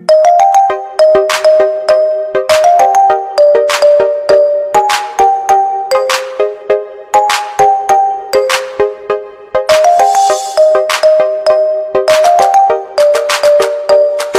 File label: (Marimba)